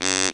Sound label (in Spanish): Venado Cola Blanca Balido Venada